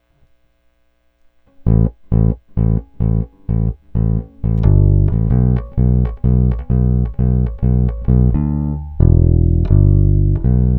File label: bass